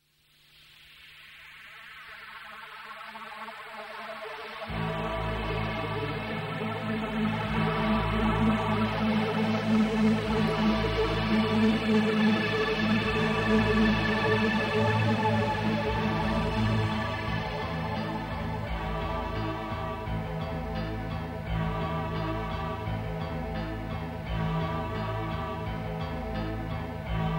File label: Plink Plonk Disco